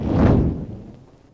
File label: flame